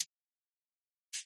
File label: mbira sfx